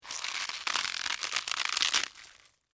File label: Tear Crunch Cabbage, Texture, Tear, Crunch, Cabbage, Leaf, Vegetable; Ripping; BlastWave